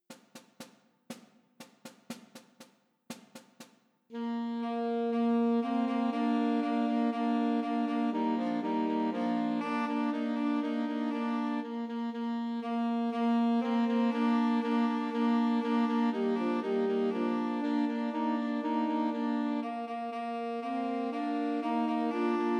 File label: Two Altos and Tenor Saxophone